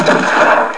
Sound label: bump